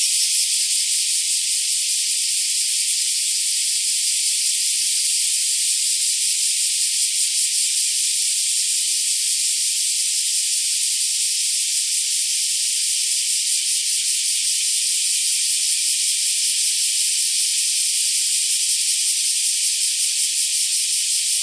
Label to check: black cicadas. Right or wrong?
Right